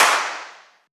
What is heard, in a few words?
Old Skool House Clap